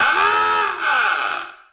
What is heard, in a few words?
Horn, cartoon Foley Fx; musicradar; cartoon caper